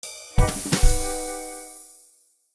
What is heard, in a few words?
Jazz instant message; Nokia; N78; Audio themes